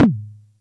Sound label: Music Percussion Computer Tom, Drum Machines